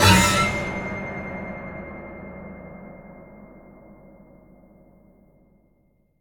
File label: sting Death; the walking dead michonne 2 give no shelter